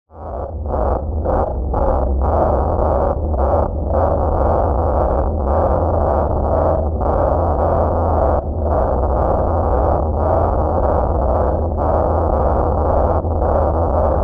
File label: DRONE DARK DIS, Drone Dark Distortion Pulse Rumble; Blastwave